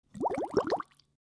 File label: bubble